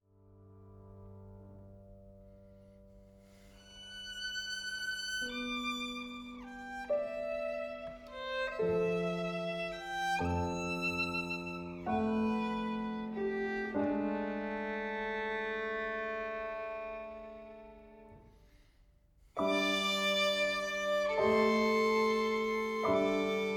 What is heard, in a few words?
VIOLIN